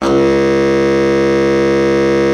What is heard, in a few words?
BARITON, BARITON SAX; Best Service; Brass Super Section